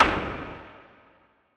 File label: Impact FX; Cymatics; Orchid Sample Pack